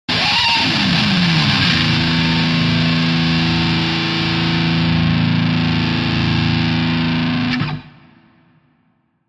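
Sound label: Electric Guitar Plectrum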